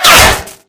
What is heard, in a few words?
bullet impact bot